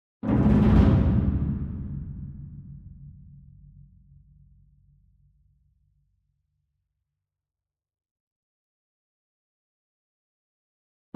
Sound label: ENSEMBLE DRUM Bass Drum Concert And Taiko Roll Far; boom library; Cinematic Strikes Construction Kit